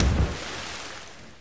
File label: jetski; giants